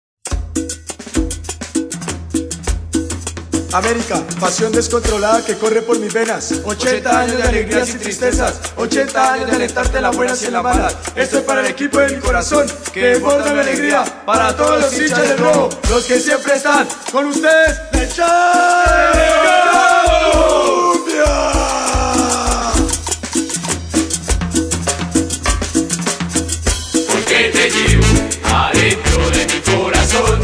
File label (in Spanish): La Cumbia